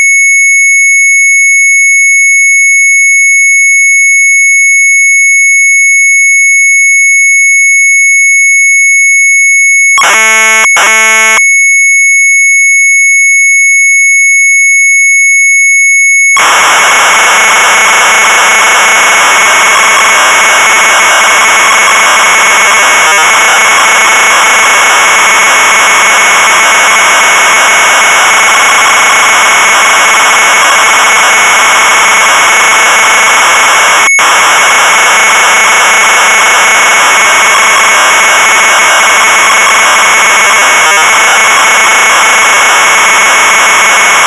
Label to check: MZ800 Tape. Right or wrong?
Right